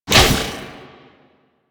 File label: Melee Thrust; Enemies; Warframe; Necramech